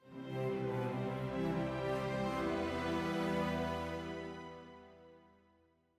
Stinger Electionresult Negative

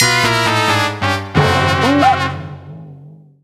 Jingle ~ Challenge Fail; Mario Super Sluggers; Wii

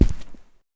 Footsteps Rug

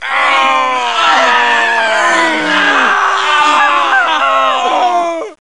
Voices Ambient - MOANS; Blood: Fresh Supply

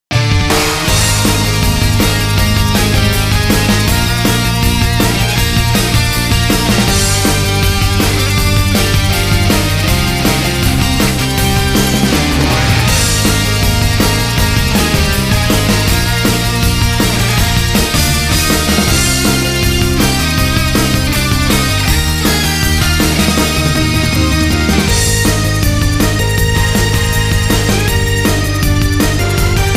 Music Success event

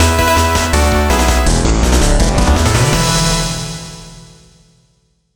victory Theme; super stone legacy

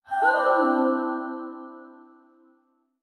Cheering No Clap; Melody Hermit

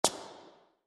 Cutie Stomp

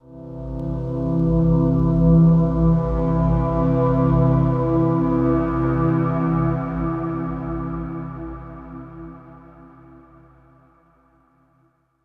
Overlay Drone; Act1 Rezoning

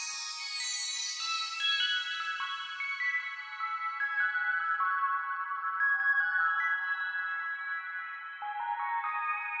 Synth Layer; Reality

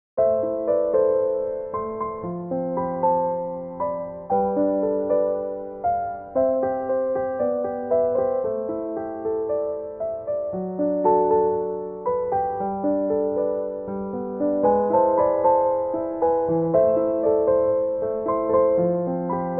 on Piano; Nights of Azure

